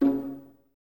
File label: Violas C#3 Pizz; Miroslav Vitous; String Ensembles